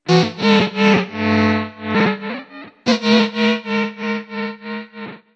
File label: wobble synth guitar